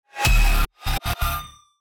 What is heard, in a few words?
cinematic glitch fast